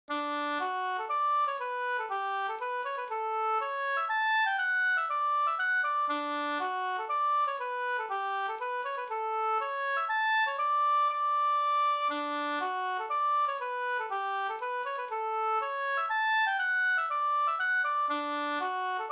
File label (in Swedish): polska Bodapolska